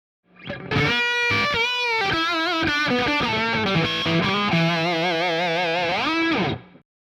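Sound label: P90 Soap Lead, guitar pickup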